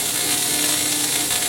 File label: electricity; asgardianstones